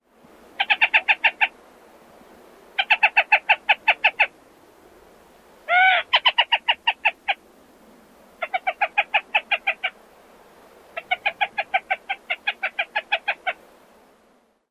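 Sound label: Black Rail ik-ik-ik-ik and growl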